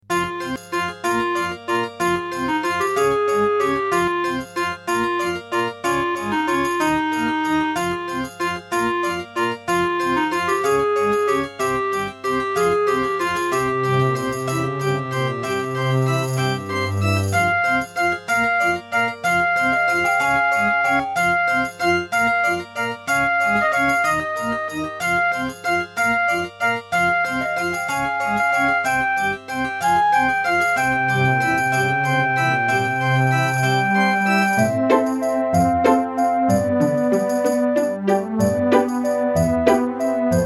Ast Advantage DBII A; Death Gate

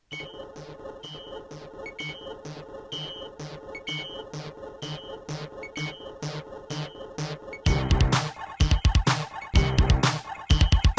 Creative Midi Blaster MB10; System Shock

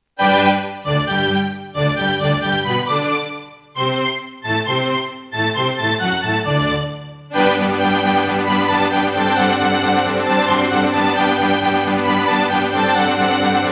Roland SD-50 GS MODE; Mozart - Eine Kleine Nachtmusik partial; classical

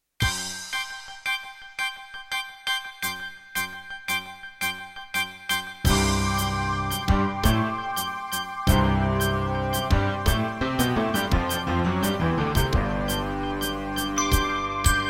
MidiPlus MiniEngine USB

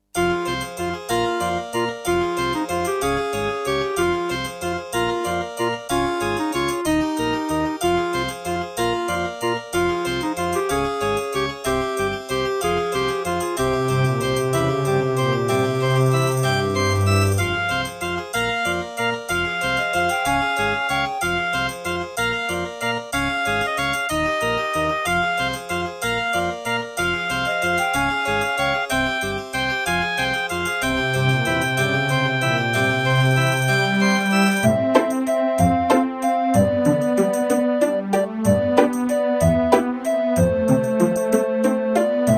Track 6, Aztech CS9233; Death Gate

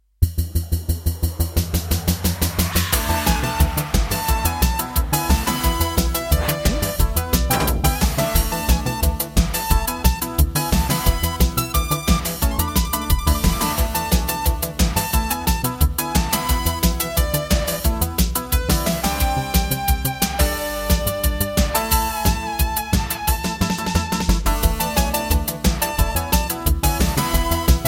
Roland CM32LROM; Willy Beamish

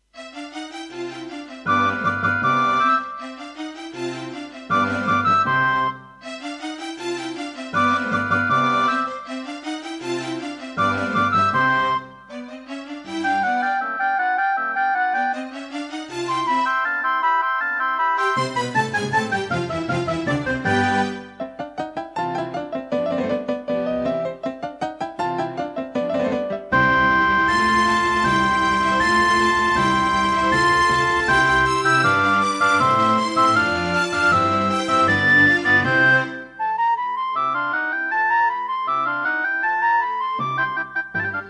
Yamaha MU2000EX GS MODE; classical; Mozart; Concerto K467 partial

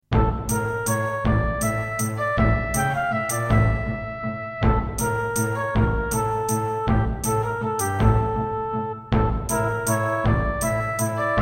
Roland SC-33

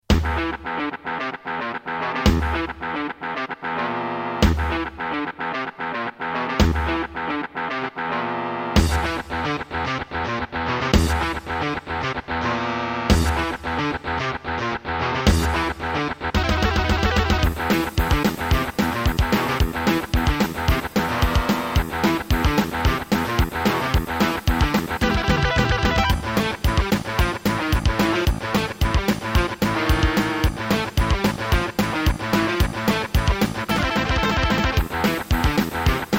Roland SC-8820 55MAP; Doom

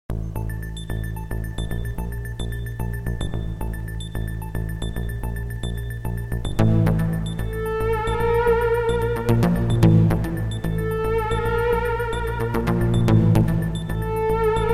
Serdaco MP32L CM32LROM

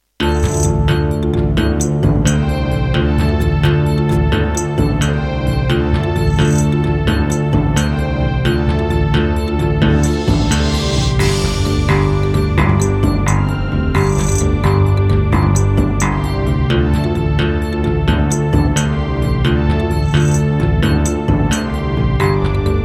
Serdaco Dreamblaster S1; Heretic

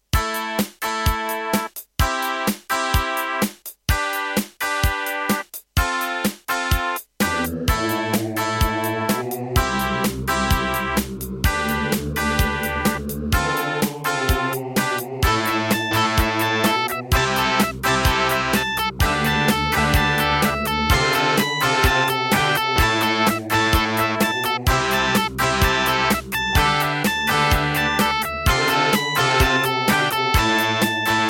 Wave 1 [Terratec WaveSystem SCW001-2]; Raptor

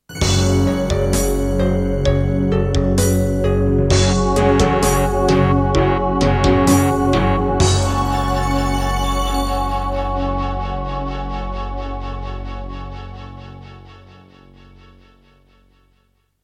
Creative Sound Blaster AWE32; Doom (Sigil); Introduction

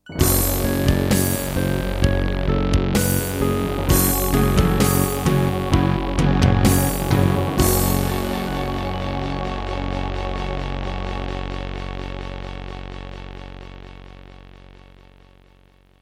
Introduction, Aztech CS9233; Doom (Sigil)

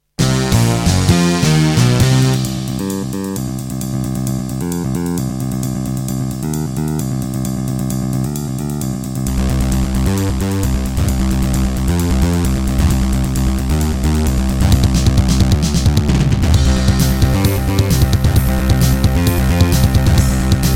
Tascam Pocketstudio 5; sigil doom episode 5

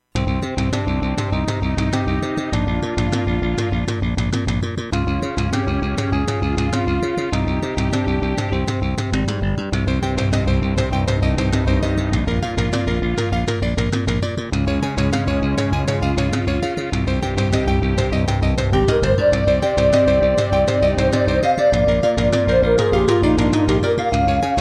Roland SCB-55; Introduction; Hocus Pocus

